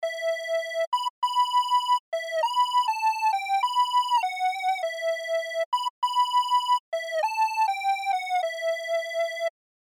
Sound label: delay